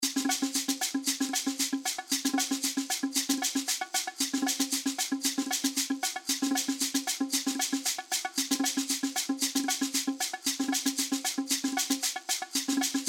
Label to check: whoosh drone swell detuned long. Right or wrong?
Wrong